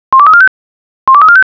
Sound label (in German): 5-tone, Feuerwehr